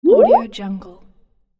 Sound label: water drop bloop